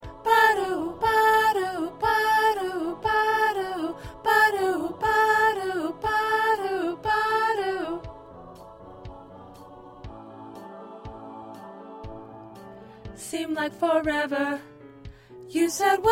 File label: 2nd Soprano